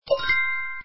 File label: audio ready; mahjong ningxia